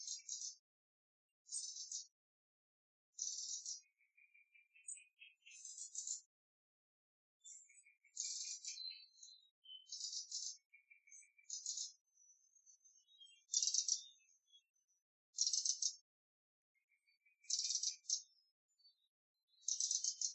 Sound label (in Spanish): ave; lugano; choir of species